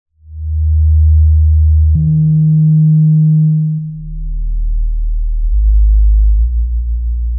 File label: 130 F# Cinematic, Bass Loops; Touch Loops; BlissedOutBeats